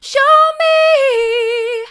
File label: mid, vocal; show me